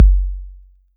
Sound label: Kick, Roland TR-808